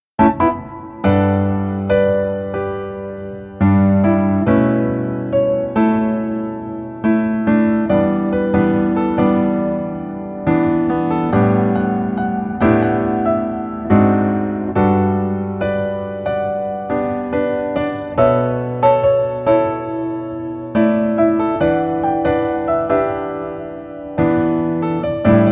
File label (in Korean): Piano ver 멜로디MR